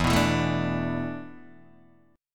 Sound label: E 7th Suspended 4th, Guitar, DADGAD